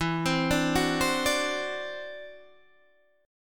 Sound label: E 7th Suspended 2nd Sharp 5th, E, down, Guitar, Open D